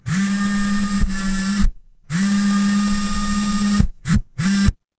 office phone vibrate

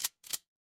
mechanical reload

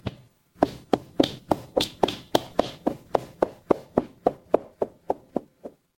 footsteps heels

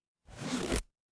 paper fabric zipper

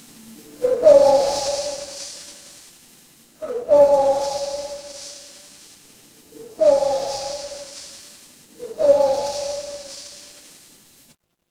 ambience forest

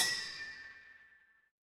instrument bell

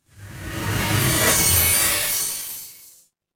magic scifi portal

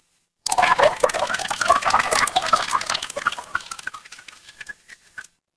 water swirl

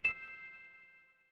office card reader

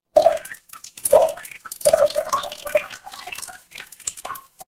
water pour

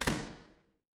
door close

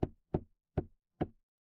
impact wood knock

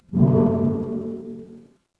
magic scifi alien

